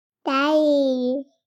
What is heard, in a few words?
BABIES TALK Boy 3 months Daddy; Baby Boom